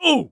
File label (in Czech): dostal; Mafie 1